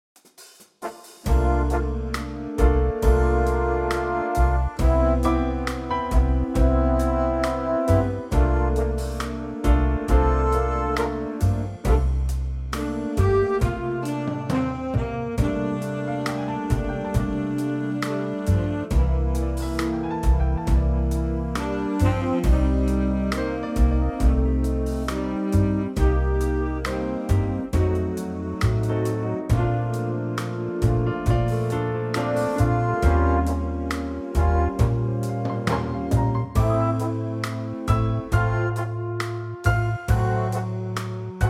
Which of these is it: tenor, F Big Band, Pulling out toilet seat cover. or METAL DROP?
F Big Band